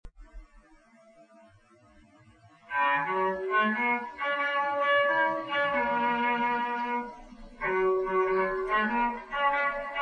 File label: cello